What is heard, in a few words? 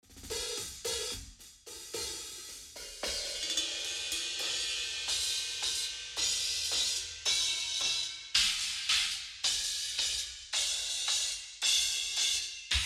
yamaha 9000 cymbals; klangmacht